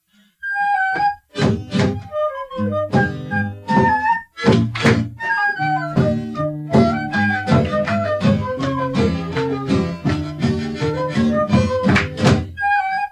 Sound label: hornpipe